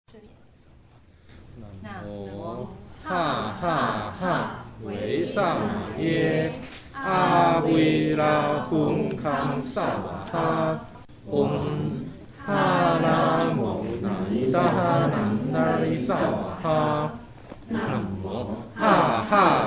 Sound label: Hahaha, Mantra